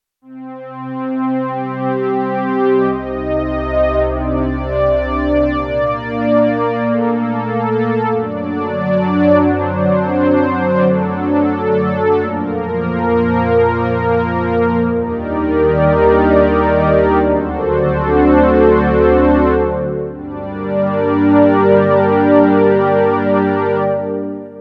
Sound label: pad JX; micromonsta2